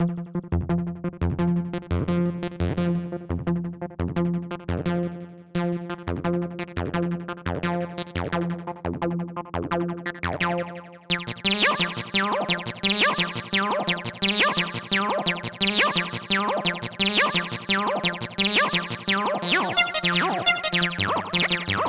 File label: synth; key(s): f minor; bpm(s): 173